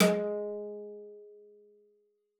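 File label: CELLO spiccato G#3; 90 s Sample CDs; Xsample VOL-5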